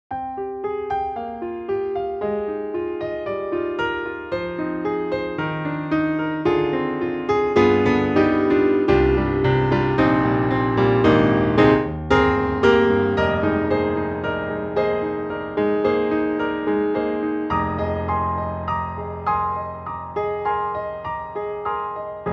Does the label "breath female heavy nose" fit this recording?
No